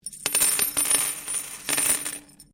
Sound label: Coin Penny Multiple Drop Laminate; Common coins; Money; Objects